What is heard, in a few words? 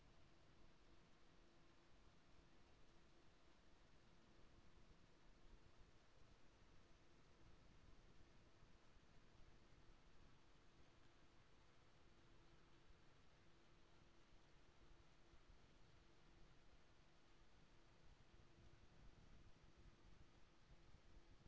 HYDROPHONE 16000Hz; JASCO AMAR HYDROPHONE 740